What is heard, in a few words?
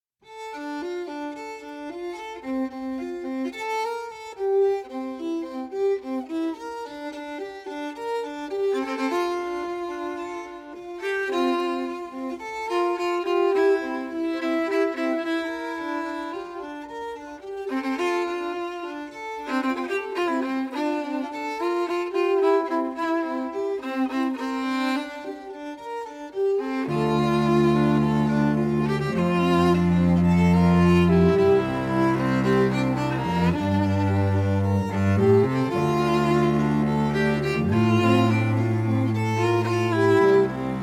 String Quartet